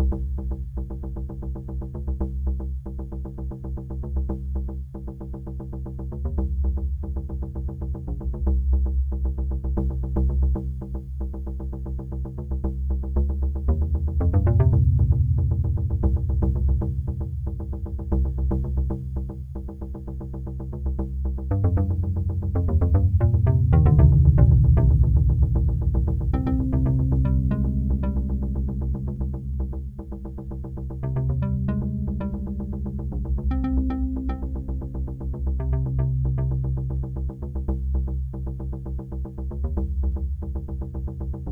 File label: harp; Gnarwhal; ludum dare 44